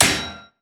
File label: frag physics bounce metalgrate hard; gmod; viper